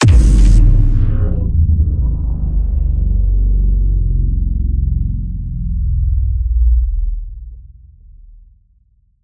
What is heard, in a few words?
Cinematic Slomo Impact; PMSFX